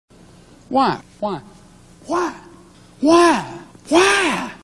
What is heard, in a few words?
Wah; preacher vine